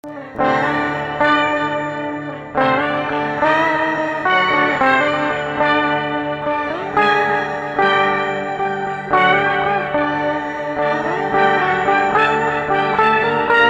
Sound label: kashmir sitar